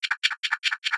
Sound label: reload